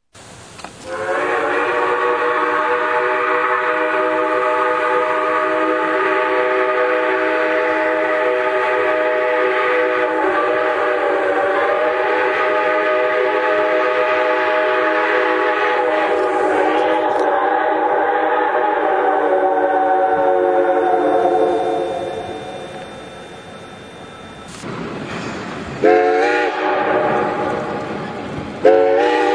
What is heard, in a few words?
6 chime, steam whistles